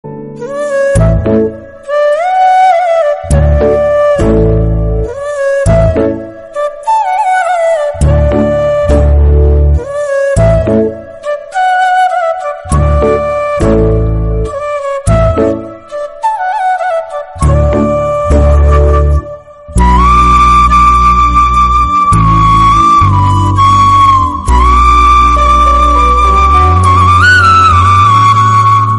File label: Sad Flute Bgm Instrumental